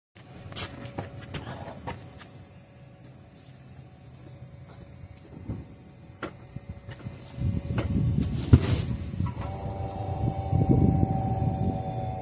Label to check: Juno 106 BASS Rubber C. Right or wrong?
Wrong